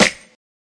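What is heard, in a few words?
snare, Classic Hip Hop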